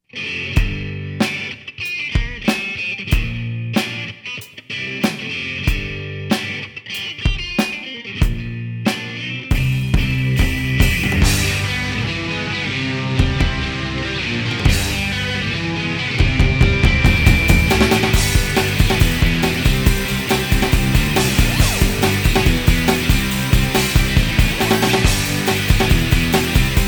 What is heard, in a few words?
Mosh Pit Alt Intro